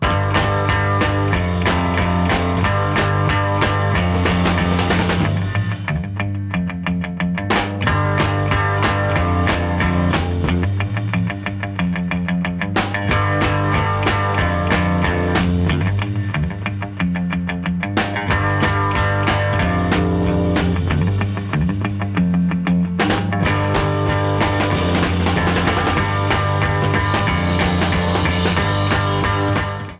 Instrumental Version

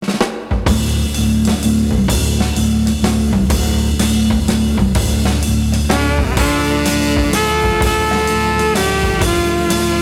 Waltz

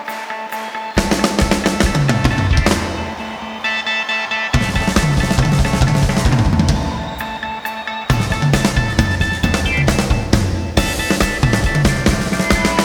Drum Solo; Live At PNC NJ 2006

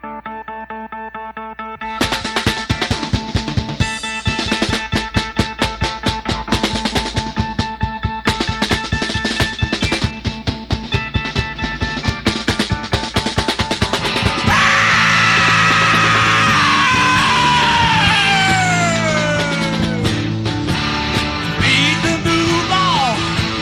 Drum Solo; Live In Largo 1973

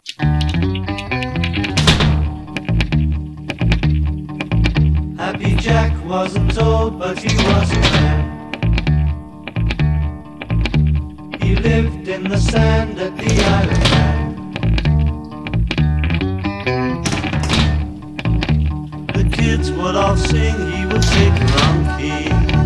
Stereo Drums

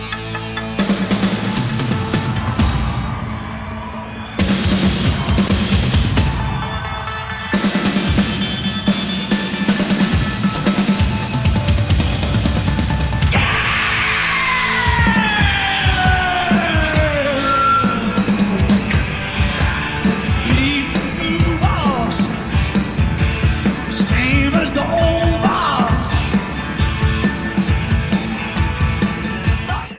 Drum Solo; Live In Boston 1985

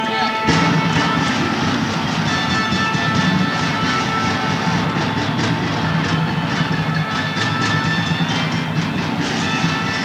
Drum Solo; LA Forum 1973 2nd Night; November 23, 1973

